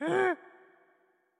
Hurt; Patella; Going Under

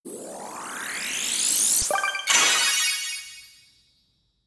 Limit Break Card Change

